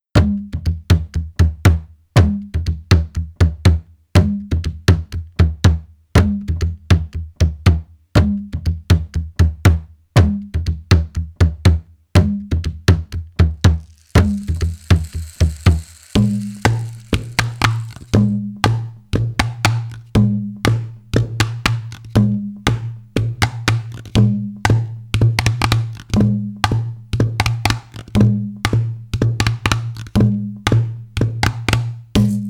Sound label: No Vocals